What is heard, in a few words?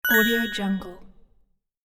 get coin game sound